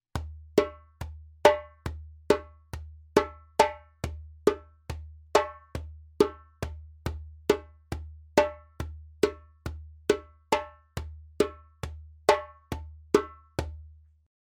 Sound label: djembe; djembebog oevelse